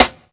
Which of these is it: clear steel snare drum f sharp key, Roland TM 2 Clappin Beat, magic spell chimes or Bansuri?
clear steel snare drum f sharp key